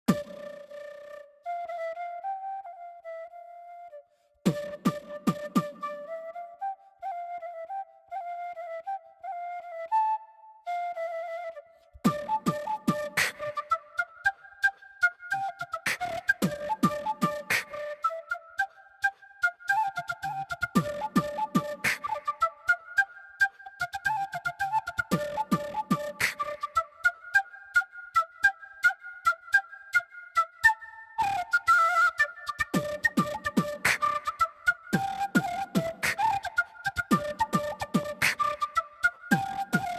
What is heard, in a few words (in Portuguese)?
flauta